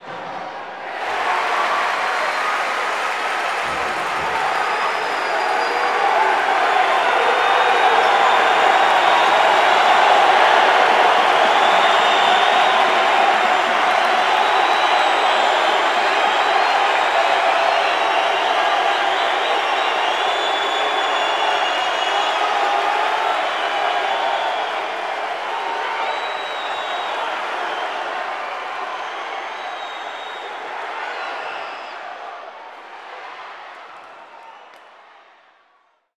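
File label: basketball crowd jeer; Crowds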